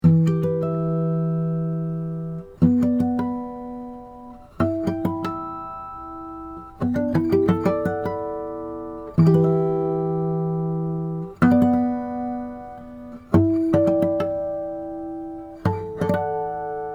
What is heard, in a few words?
Harmonics; Neumann KM253